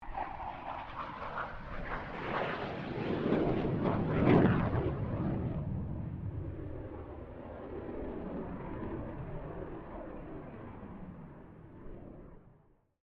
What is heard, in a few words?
1997 BAE HAWK 127 PASS; Airplanes (Sound Effects:Vehicles)